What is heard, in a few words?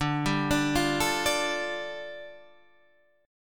G 7th Suspended 2nd